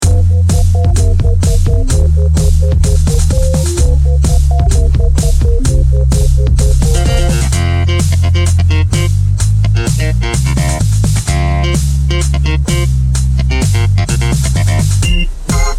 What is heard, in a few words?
groove dance funky